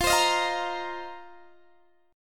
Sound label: F 7th Suspended 4th, Guitar DADGAD